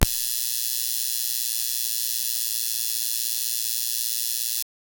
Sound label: electric spark crackle a